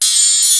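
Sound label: wind chime; sound canvas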